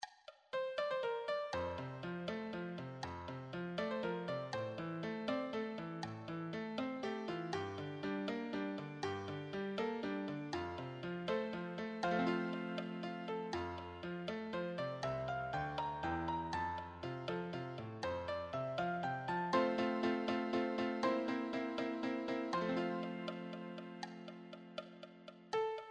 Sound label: advanced solo, piano